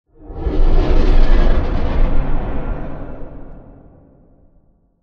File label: Assembly Plate Close Whoosh; Boomtown; Titanfall 2